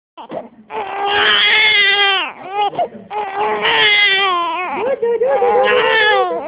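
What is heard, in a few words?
baby hungry, male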